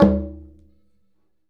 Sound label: Conga Flam; Essential Percussion